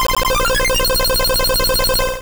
Aurora Beam; Pokemon; Gen 2; attack moves; GSC

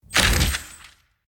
Confetti; Angry Birds

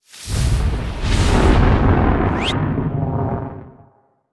Rocket Blast Off; Taps to Riches; android

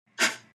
SFX Miss

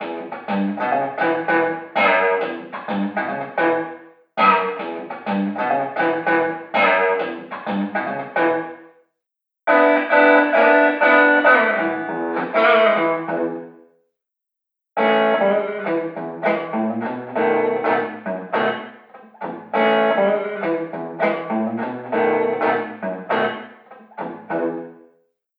Guitar Lesson; another code r a journey into lost memories wii

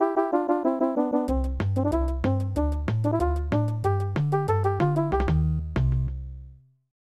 Game Clear; Classic Kong Complete

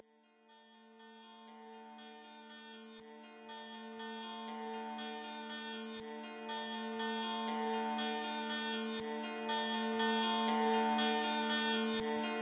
Mid Section Music; ether one redux gamerip 2015

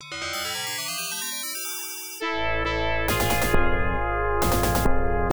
Level End; Immortal; Genesis

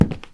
Fast Step

